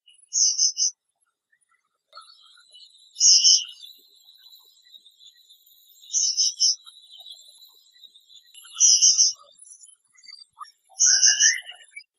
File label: Rufescent Prinia calls; Ipoh Perak Malaysia